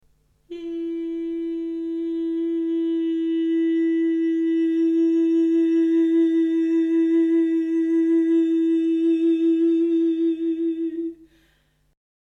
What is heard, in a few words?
Bright to Chiaroscuro